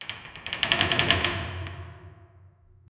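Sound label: heavy medieval wooden door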